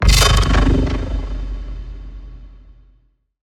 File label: Industrial Terror; PMSFX